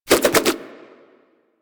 Nunchuck Spin Whoosh; Warframe; Infested

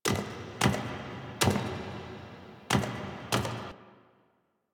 Sentient Throne Room Steps; The New War; Ballas

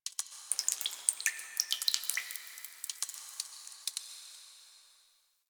Ocean Cave Drips; Ambience; Warframe; Grineer Ocean

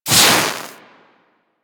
Axe Projectile Impact B; Sundial

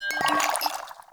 Vial Foley

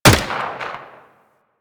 Rolling Drone Turret Fire

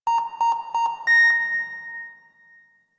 Hack Alarm; Entrati Labs; Transference 1999 Cinematic

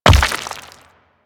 Rock Elemental Footstep Default; Warframe; Brawler

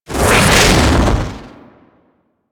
Tornado Cast; Warframe; Tengu

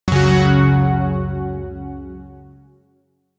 Shawzin Chromatic Chord D; Music; Warframe; Zariman Shawzin

